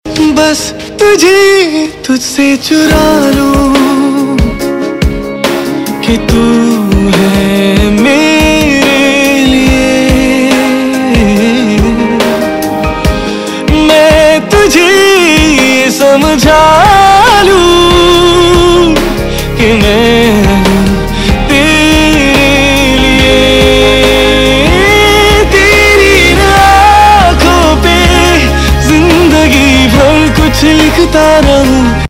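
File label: Ringtone, Bollywood Punjabi Pop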